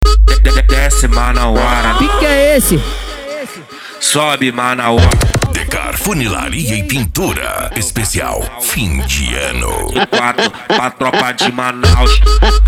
funk ritmado

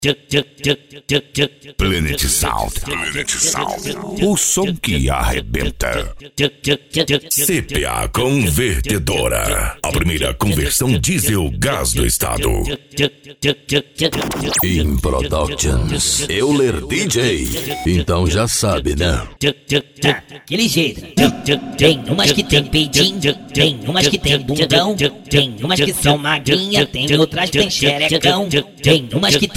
funk ritmado; cuiaba mt

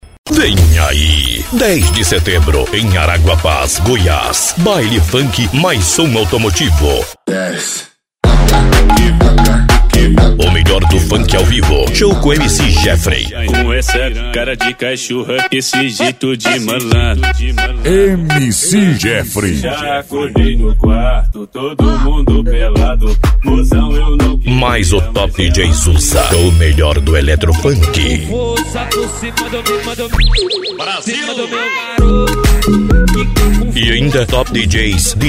baile funk som automotivo; araguapaz go

